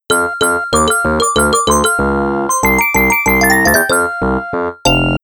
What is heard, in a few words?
Level Transition; Mario is Missing; MS-DOS